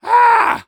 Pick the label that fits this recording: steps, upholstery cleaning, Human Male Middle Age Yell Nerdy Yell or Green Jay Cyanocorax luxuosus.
Human Male Middle Age Yell Nerdy Yell